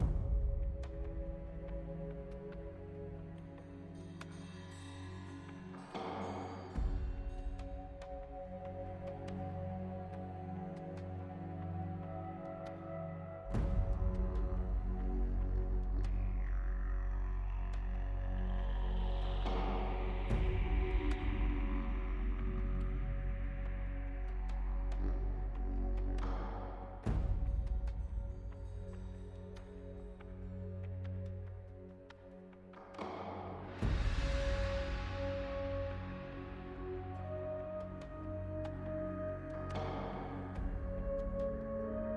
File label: music Proving loop; lol